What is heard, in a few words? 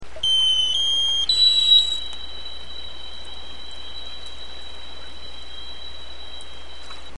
sonar; salish sea data examples; signals